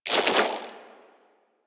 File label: Igniter Pistol Reload; Warframe; Weapons; Corpus